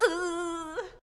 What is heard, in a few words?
Electric; Jackie